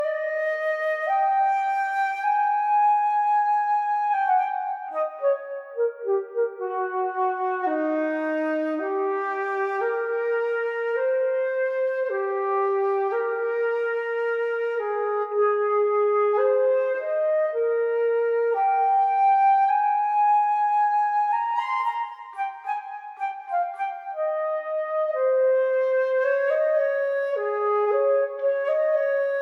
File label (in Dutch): Fluit; Toki Tori 2